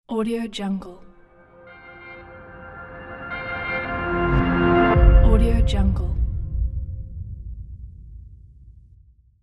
soft logo reveal

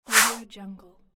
swipe

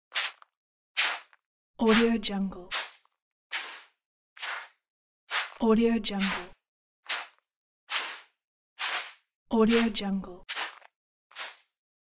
footsteps on beach bare feet

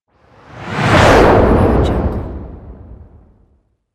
graphics slide

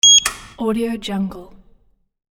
notification sound

